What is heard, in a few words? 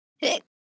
Young female teenager hiccup